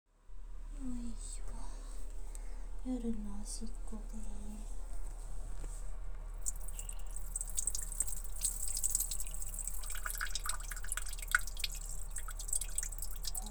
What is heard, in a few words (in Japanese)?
お家のトイレでオシッコ